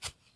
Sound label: brick hit soft